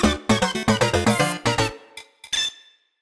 Lose Life; Kirby; Classic Music Collection

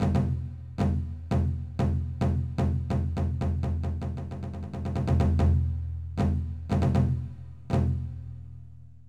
Jingles

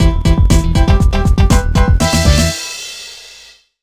BGM Sudden Death; Foto Frenzy Spot the Difference 2009

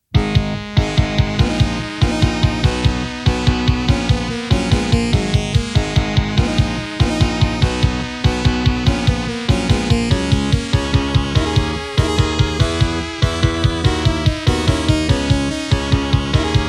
ballad

